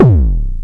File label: ms20 kick long looped; MS-20 drum pack